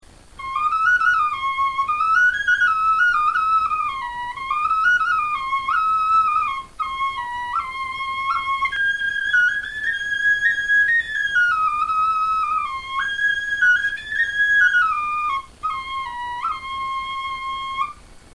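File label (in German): melodie, Knochenflöten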